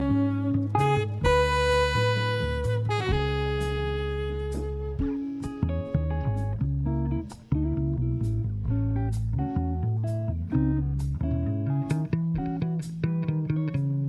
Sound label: Bass solo